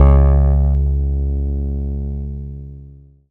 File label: C#, Synclav FM PLUCKED; Big Fish Audio